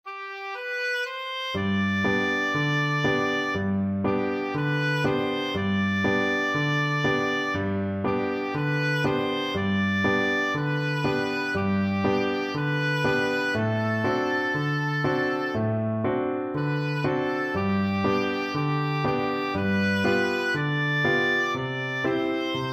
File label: when the saints, oboe